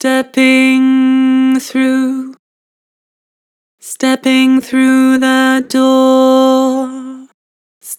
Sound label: Vibrato, Vocals; Stepping through the door; Harmor Vocals